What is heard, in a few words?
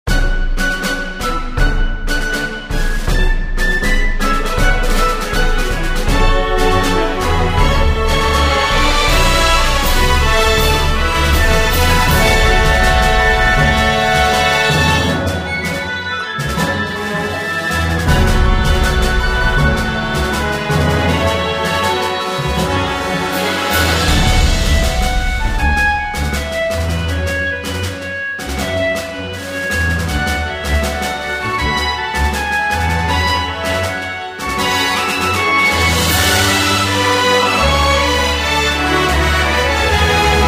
Battle BGM